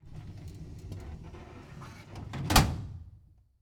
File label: Household Filing Cabinet Drawer Closing